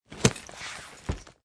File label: case luggage